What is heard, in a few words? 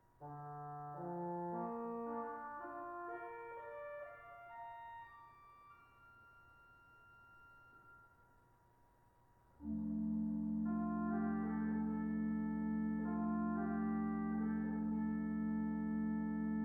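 choir oboe, organ; 8’ Oboe; The English style of Oboe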